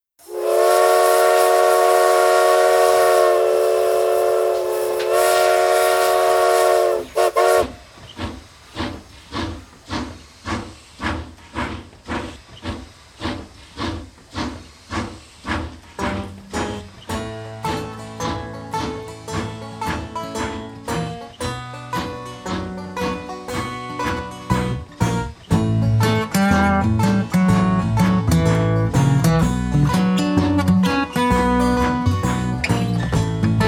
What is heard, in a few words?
Choo Choo Train Instrumental